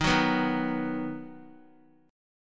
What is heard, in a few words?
Eb Minor Sharp 5th, Guitar, Ebm#5 chord; strummed; JGuitar